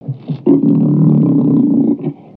Exotic Lizard Growl; creatures pets

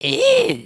fart; emotes

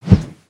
jet pack sweetener; emotes

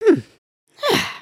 adjust hat; emotes

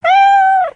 Pet Cat Meow; creatures pets

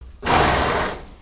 horse snort; creatures organic

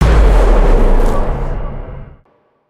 dark reveal; cinematics; Darkitect

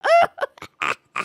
giggle

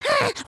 freak out, emotes

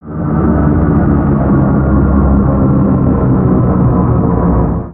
Siege Tower Travel; destructibles

